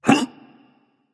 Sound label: surprised, voice; Smite; ymir skin14